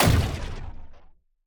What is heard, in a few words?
shield break small; Mindustry